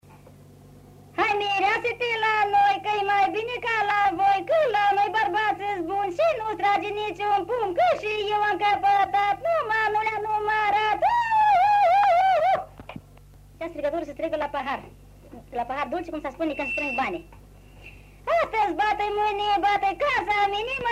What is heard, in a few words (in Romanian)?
Strigaturi la pahare dulci; Vaslui